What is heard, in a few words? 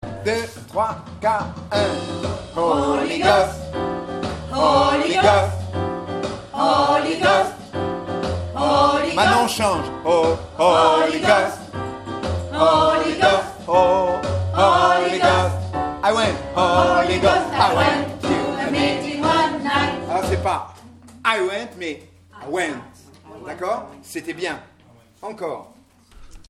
holy ghost alto